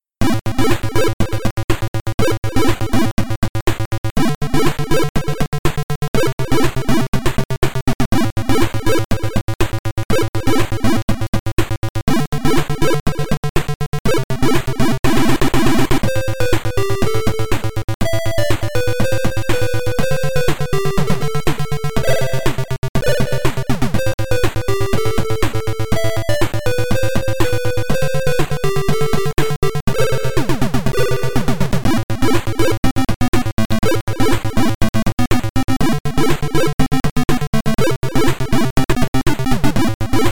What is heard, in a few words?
music tandy